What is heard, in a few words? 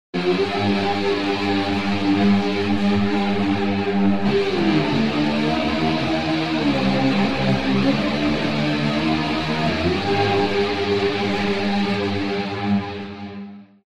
Rotary Speaker Crackling Whirl Guitar Wet; AmpVibe; Syntheway